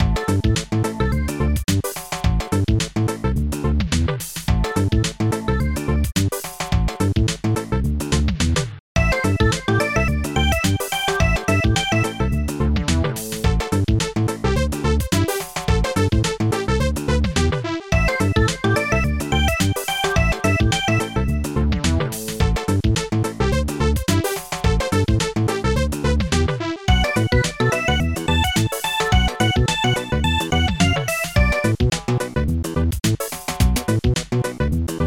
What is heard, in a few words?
Reggae; da capo vol1